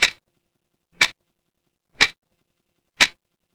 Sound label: mechanical clock tick